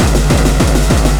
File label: drumloop; awe32